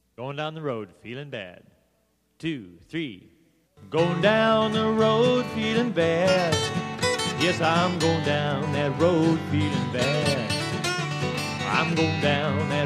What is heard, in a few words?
travis picking